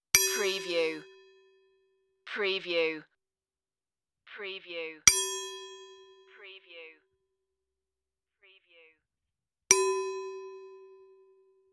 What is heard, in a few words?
bell cymbal bing